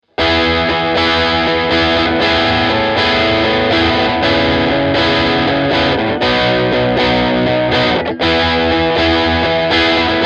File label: blackstar ht dist